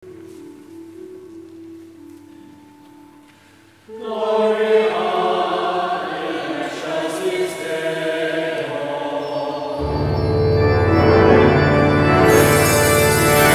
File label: GLORIA ORGAN FANFARE IMPROV; HOLY THURSDAY